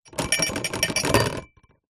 household plastic tray tub containing glasses plastic cups short shake